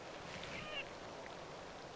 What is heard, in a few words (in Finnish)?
tavi